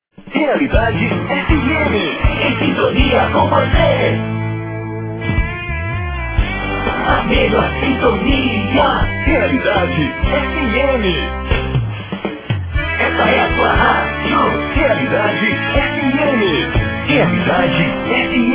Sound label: country